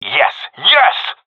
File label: cheer, seal diver; vo; agents; Source Model Packs; Counter Strike Model Pack; CS2